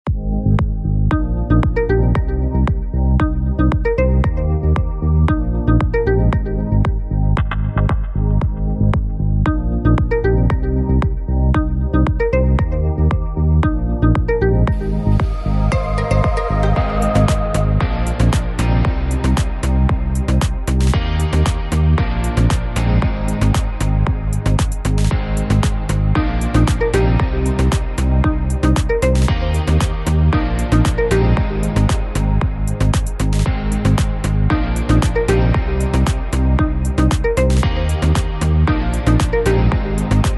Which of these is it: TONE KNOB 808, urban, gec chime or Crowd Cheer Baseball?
urban